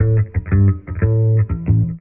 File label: bass blood beast; Loops; Phonky